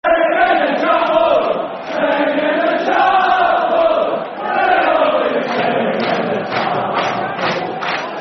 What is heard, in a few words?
Sing In The Chapel, Football Chants; Rangers GRFC